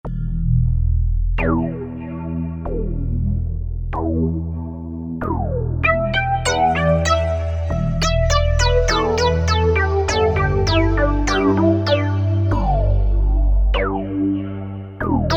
Vintag 2600, Alesis Z2 EuroDance

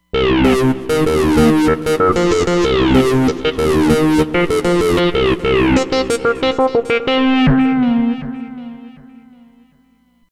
TB Booster, Roland Fantom XR

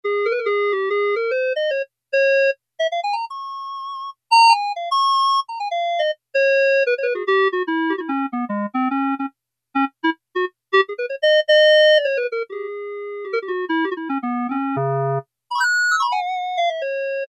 CLARINET, Yamaha DX7

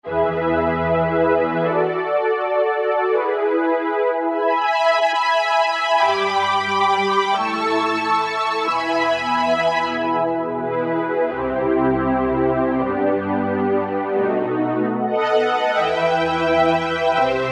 Strg Brs, E-mu Morpheus

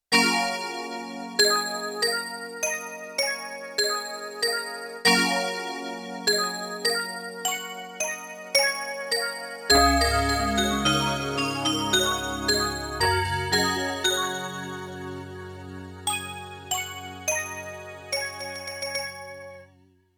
Glass-Chimes, Yamaha TX16W; Spacey Keys

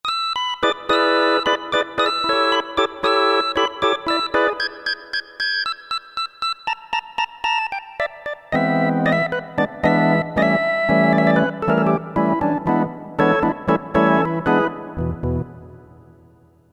Elec Org 3, Roland MT-32

